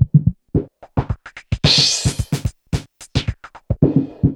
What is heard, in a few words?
Juno Filtered Korg MR-16 (110BPM), beats